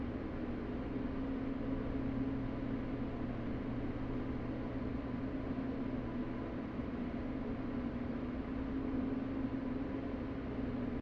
gulkij besprerivnij gluhoj zvuk raboti proizvodstva

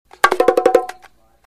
barabannaya trel bongo korotkaya

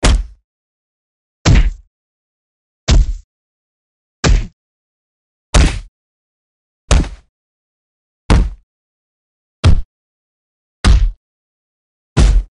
boks udari v bokserskih perchatkah po telu

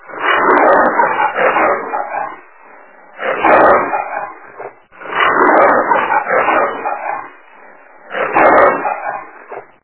shvatka lvov za samku

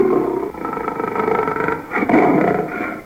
ustavshij lev vozvrashaetsya s ohoti

